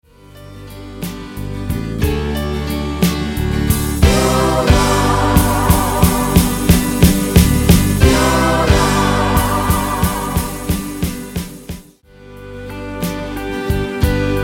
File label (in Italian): Terzinato Canto